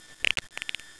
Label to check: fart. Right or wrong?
Right